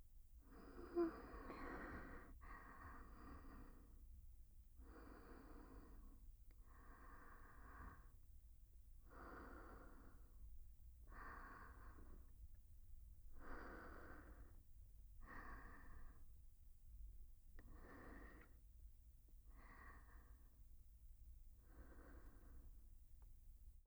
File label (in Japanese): 寝息 ループ, ASMR